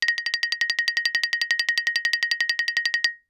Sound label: Xylophone rosewood roll ff Bb6 stereo; MIS Pitches - 2014; Percussion